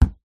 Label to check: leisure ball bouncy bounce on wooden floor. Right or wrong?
Right